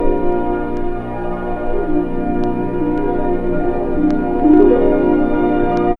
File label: ORCH